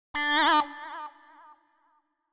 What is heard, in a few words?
FX Synth